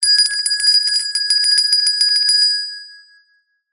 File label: bell small hand ring short